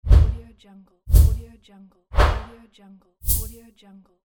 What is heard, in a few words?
whoosh sounds